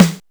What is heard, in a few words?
SP1200, DRUMMACHINES